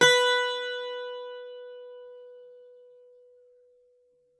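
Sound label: bouzouki B4; SoundBytes; Early Patches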